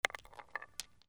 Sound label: FRICTION